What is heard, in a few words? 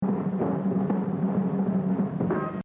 Exercise 9, Jazz Drumming - 100%; Gene Krupa; The Evolution of Jazz Drumming (Gottlieb)